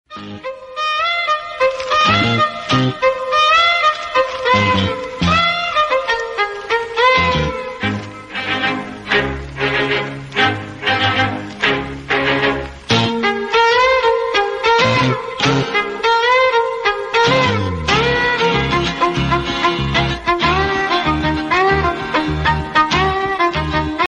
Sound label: song bgm ringtone, the old song bgm